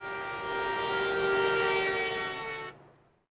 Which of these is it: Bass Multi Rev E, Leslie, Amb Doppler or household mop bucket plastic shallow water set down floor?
Amb Doppler